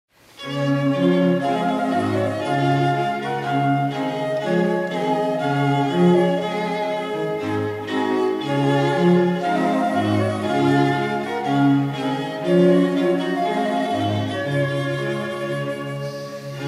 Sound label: trumpet